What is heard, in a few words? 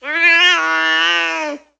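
Cat Domestic Meow Angry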